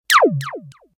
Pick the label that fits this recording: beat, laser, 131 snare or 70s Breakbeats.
laser